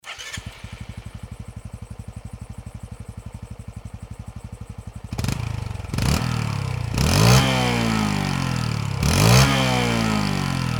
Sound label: ct125 normal